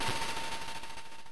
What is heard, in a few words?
teleport; Juegos Retro; exolon